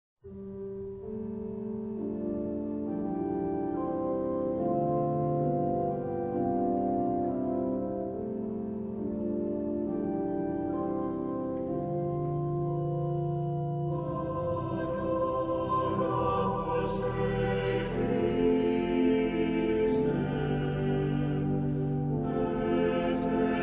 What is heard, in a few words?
Adoramus Te, Christe, Catholic Hymns; Dubois